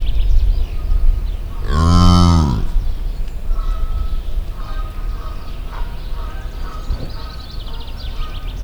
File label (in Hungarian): szeles, kanadai lúd, kardszarvú antilop; debreceni zoo 2012; marantz puska